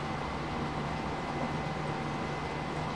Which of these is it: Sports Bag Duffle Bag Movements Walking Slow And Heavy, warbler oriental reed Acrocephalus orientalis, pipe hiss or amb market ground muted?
pipe hiss